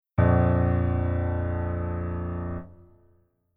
Keyboard Voicing Basic

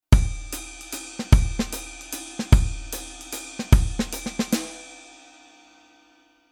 Rhythm Jazz Waltz

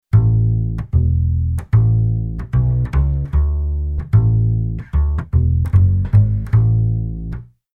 Bass Arr Country Bass

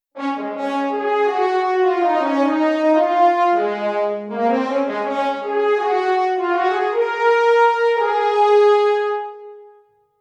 layer

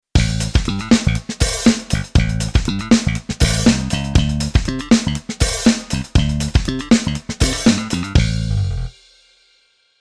Bass Arr Funk

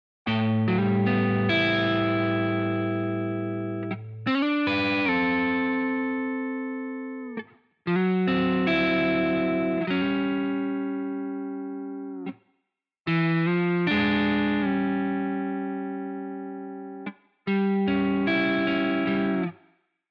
guitar pattern 2 gt

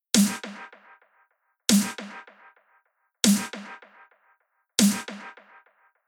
Delay On Filter

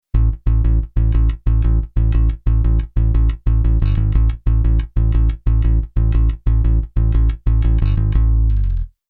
Bass Arr Blues Bass

